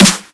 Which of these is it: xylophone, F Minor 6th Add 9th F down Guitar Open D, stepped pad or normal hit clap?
normal hit clap